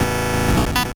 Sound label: 8bit se